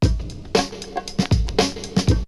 Drumloop; ZERO G - Datafile DISC 1

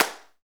PRC XCLAP, Hand Claps

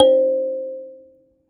Finger, Prepared Kit; Battery 3 Sample Kits

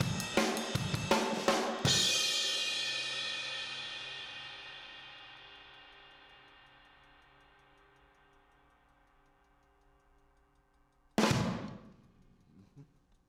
Outro Room M, 162 BPM, Drums; Drums from the Big Room The Mixes; Take Your Toms Off

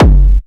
KICK hardcore, Techno Trance DRUMS; Techno Trance Essentials

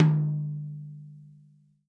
TOM, Real Drum Kit, FUNK KIT

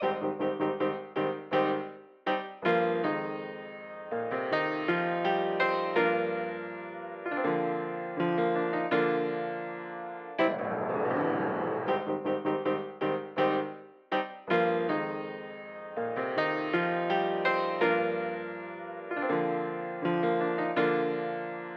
old piano, Smooth RnB; 081 Cmin

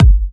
Bassdrum

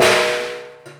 Prepared Drums

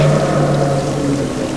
Sci Fi Loop

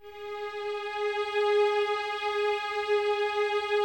Violins Soft G#4, String Ensembles; Miroslav Vitous; 11 Violins

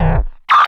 BLURP LOW, Hard & Loud Techno

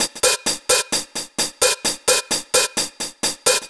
Live Breakbeat Hats, Drum Loops 130bpm; Zero-G; Soundclash Nu Dance Electro; Acidized Wavs

